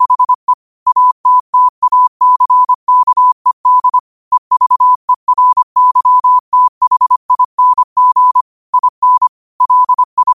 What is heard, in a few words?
5 wpm, morse